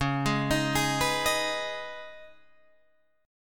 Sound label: Db 7th Suspended 2nd, Guitar, Open D